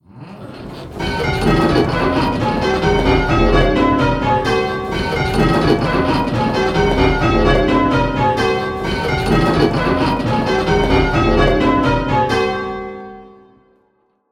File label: Ionian, bells; Major